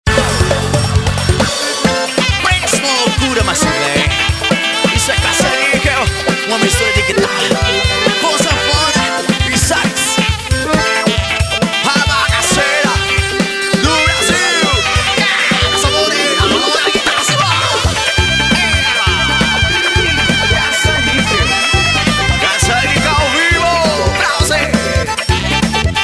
instrumental

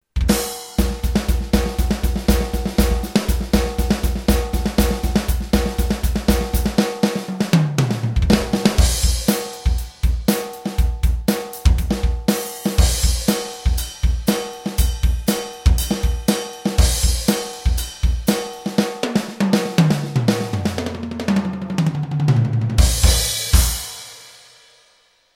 baterista solo

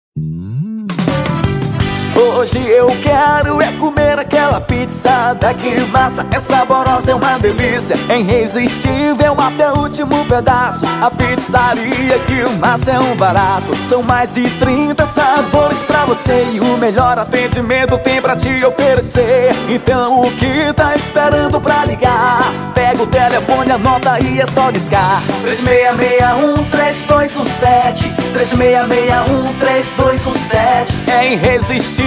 jingle pizzaria; que massa; kazamstudio

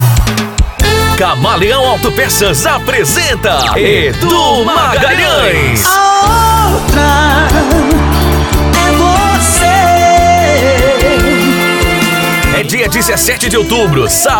canto sertannejo; gm gravacoes

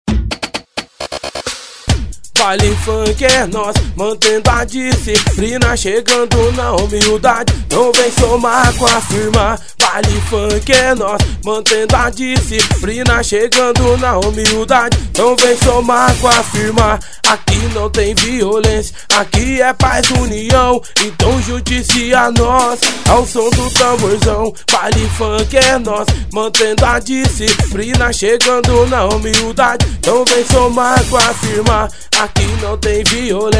baile funk

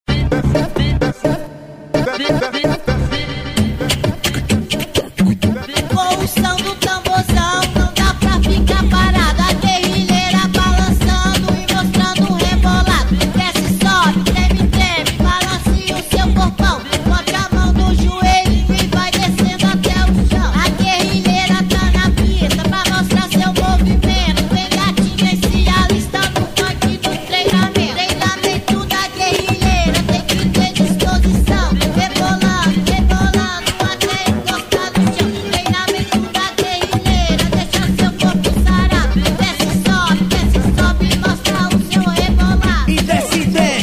funk

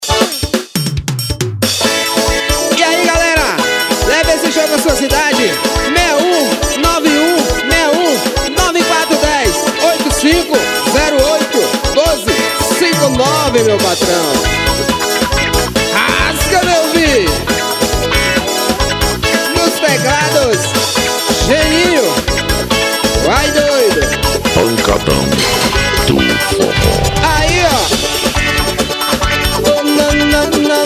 forro instrumetal

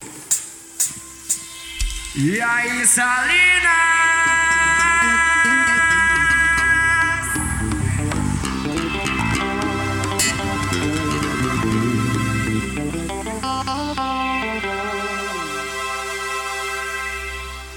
abertura

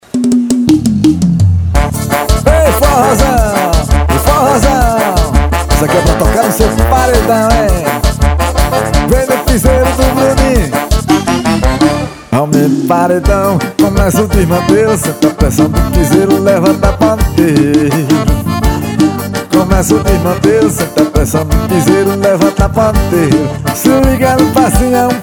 piseiro do vaqueiro

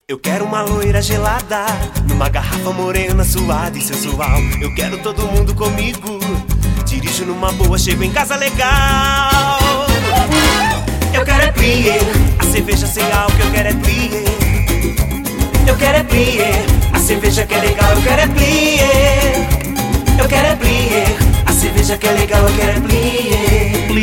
jingles

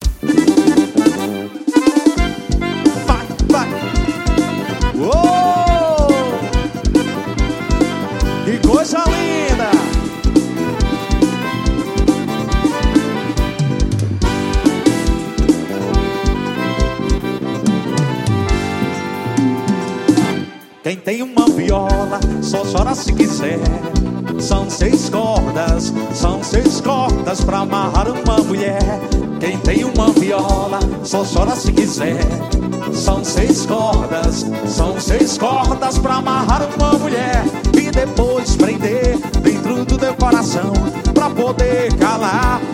seis cordas

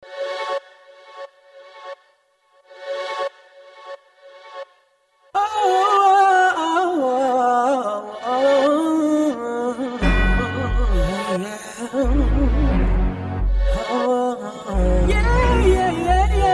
rap

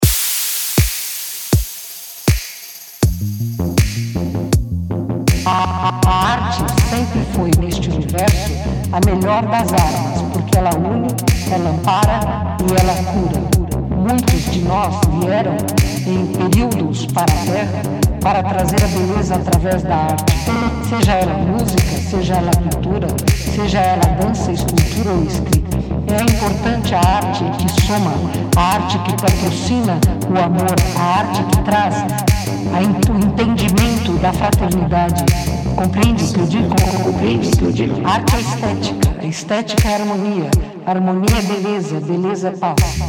interludio

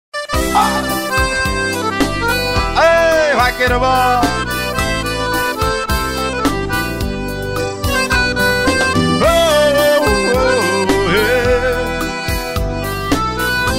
pout porrir vaquejada

